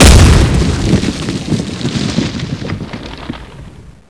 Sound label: explode